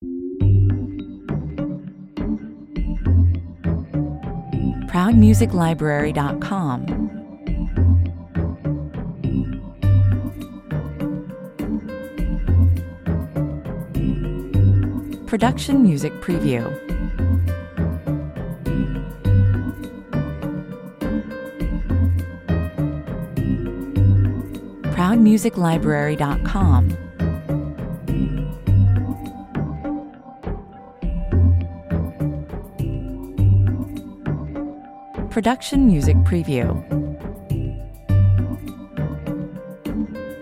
A fun, playful, and inquisitive childlike mood is created by a quirky piano melody, 102 bpm